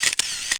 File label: Shutter